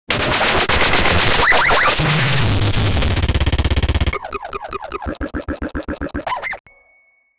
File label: glitched animatronic jump